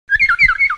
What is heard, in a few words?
north island kaka